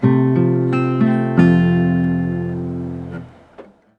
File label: LUTE